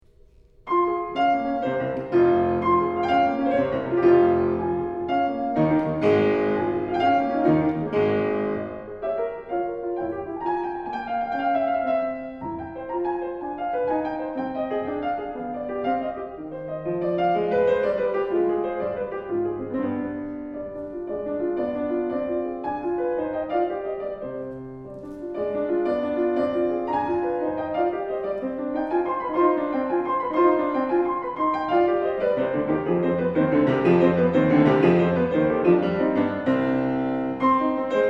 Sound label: keyboard sonata in f m; scarlatti